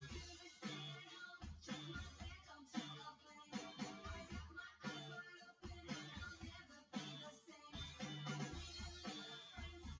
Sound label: wdrums synthconv 250ms 1m src; SiSEC08; SiSEC underdetermined